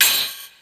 Noize Metal

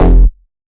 Bass F, Bass Synth

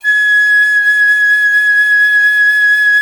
FLT PIC., Solo Instruments; Miroslav Vitous; Solo Instruments VOL-2

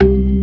ORGAN, C3 PERC; Keith Emerson Signature Series

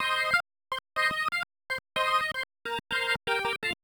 125 Hammond Riffs C, Keys, Nu Jazz House; PowerFX - Nu Jazz House

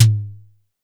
Drum X Tom Misc; Pearl Drum-X

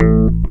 CLAV BEL; USB Soundscan vol.32; Roots Disco; AKAI

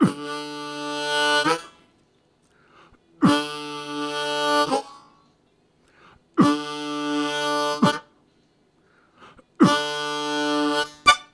Low Cough, Harmonica; Zero G

